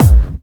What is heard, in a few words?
Bassdrums Clubby Kicks; Vengeance Samples